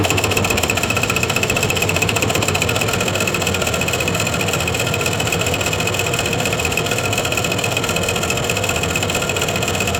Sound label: aircraft rotary engine; aeronautics